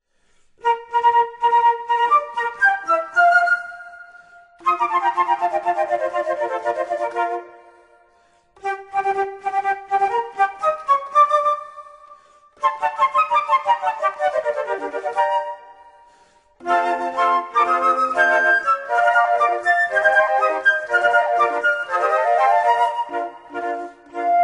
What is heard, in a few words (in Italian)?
Fratelli d'Italia Flute Quintett